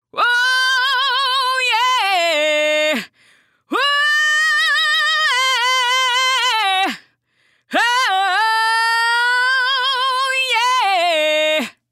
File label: Singing Sample